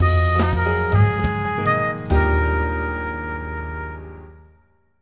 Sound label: win jazz start